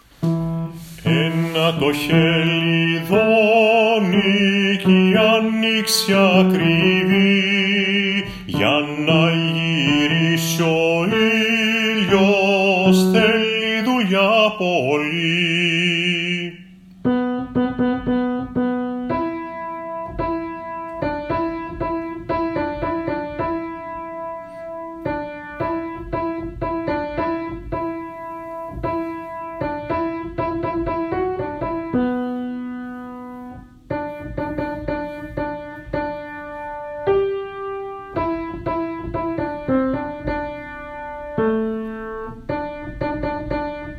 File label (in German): Alt Klavier